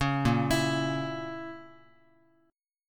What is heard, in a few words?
A sharp Diminished A sharp, Guitar; arpeggiated